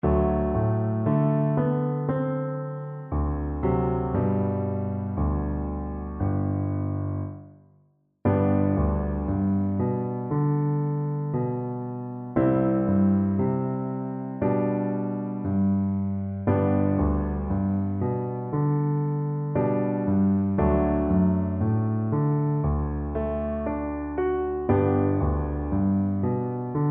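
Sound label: viola karaoke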